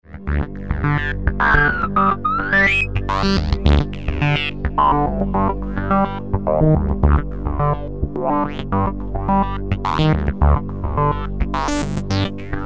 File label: ms20 filter